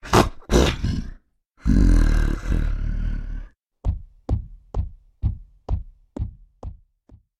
stomping running away; blindside

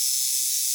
Menu Zoom Loop; Menu FX

